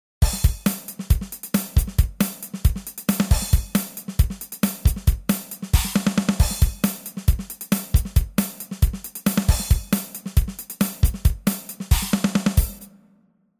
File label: Drums